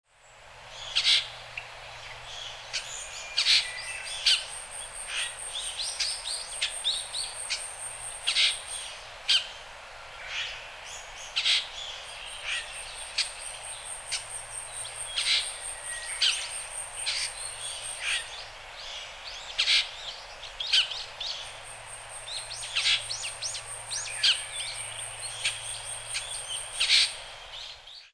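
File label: Japanese Grosbeak, Hyogo; ikaru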